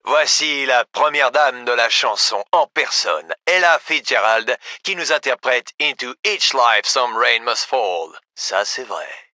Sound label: music